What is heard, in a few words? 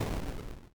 Explosion; Eleven: Stranger Things The Game